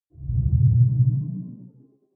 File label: sci-fi display transmission submerged